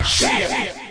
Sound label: blash chea vox